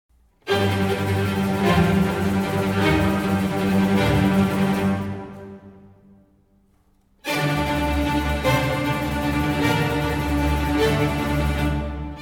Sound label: Violin Concerto In G Min; denon; Vivaldi